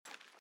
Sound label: armour Light Run